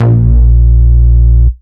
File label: Vengeance Ultimate Bass A# Root A#; Vengeance Samples